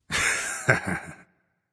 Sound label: laugh; smite; hou yi